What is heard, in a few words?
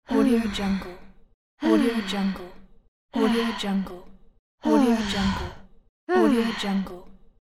girl heavy sigh